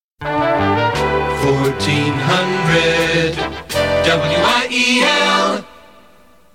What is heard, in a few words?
jingle